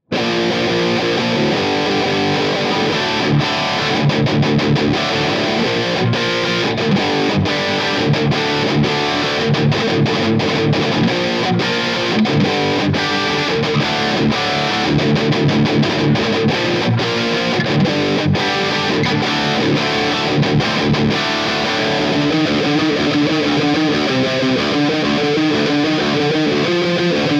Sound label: characteristic sound; jensentone